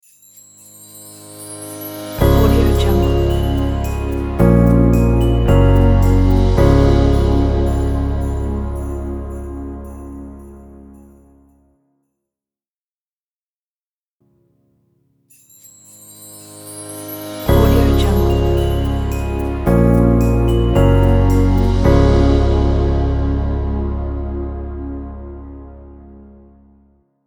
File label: christmas logo; reavermusic